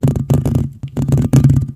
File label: Microphone Scratch, Foley; SFX; 7rystan's 600+ FREE EDM SAMPLES & DRUMS